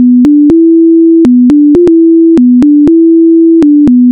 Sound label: tune